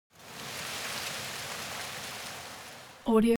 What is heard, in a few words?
foley vegetation tree leaves rustle soft short